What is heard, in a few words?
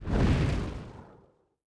fireball